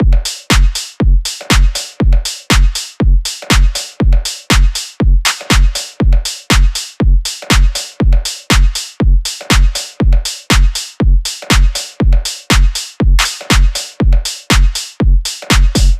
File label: drums 120, Full Drum Loops; Slate; SEISMIC Drum Sample Pack